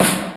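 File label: SY77 snare drum; Crypton - DrumBase5000; SY77 DRUMS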